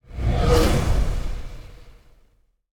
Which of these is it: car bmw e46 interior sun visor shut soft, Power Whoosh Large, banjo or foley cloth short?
Power Whoosh Large